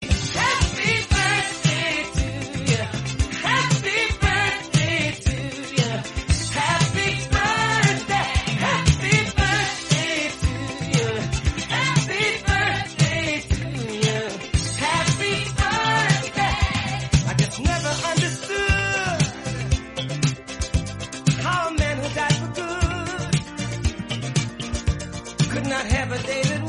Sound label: happy birthday english song